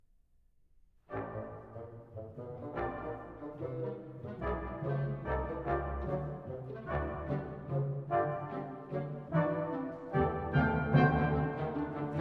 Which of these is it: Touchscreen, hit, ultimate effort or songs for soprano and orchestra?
songs for soprano and orchestra